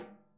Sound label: SNARE, Interactive Drum Kits